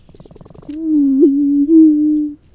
boom sound; whalen

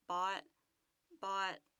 Herd bot

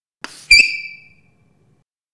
sound example chalk; Oehler

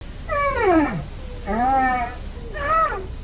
humpback eeps

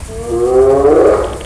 hyena

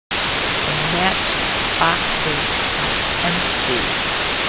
clear noise

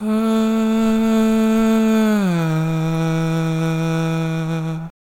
detuned sound

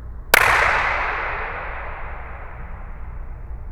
raquetball clap corner

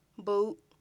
Herd sound

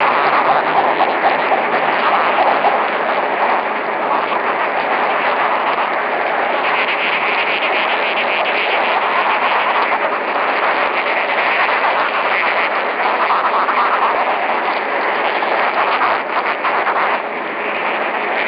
alarm

